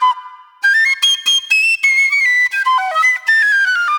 Spit Plate Flute; Sonic Foundry (Sony Creative Software); Manhattan Flutes; Session 03 - Classic Caddy

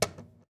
CAJON; Univers Sons - Raricussions

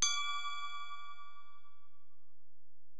Bell, Alesis DM-PRO

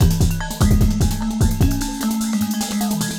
Beat, Drum N Bass

